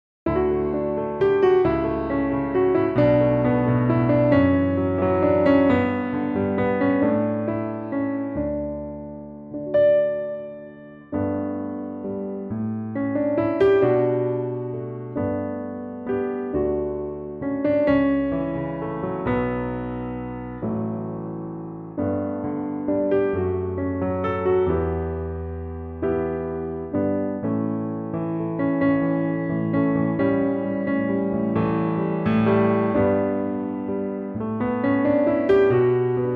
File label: D piano; key - D; Pianotrak; Really lovely piano only arrangement